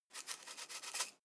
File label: herbs shake dull; soundpool